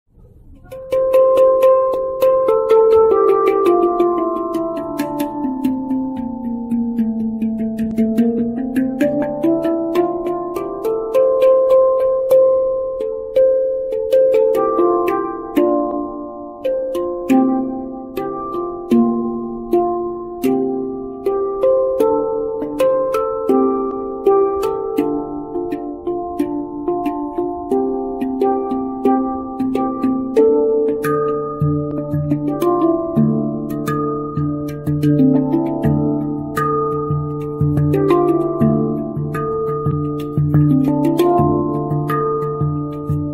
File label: handpan 10 notes; vert de gris